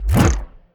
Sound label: atk cello